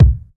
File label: warm kick drum one shot b key, kickdrum sample tuned to the B note; kick drum samples